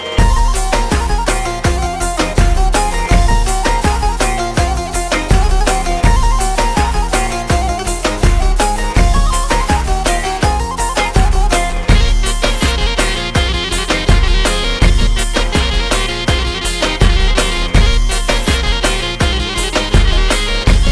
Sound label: Viola